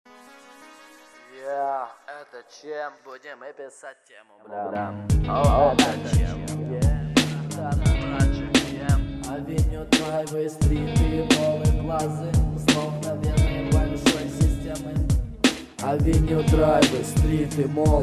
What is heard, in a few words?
rap